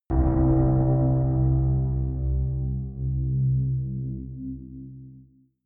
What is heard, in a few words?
synth hit; whooshes rises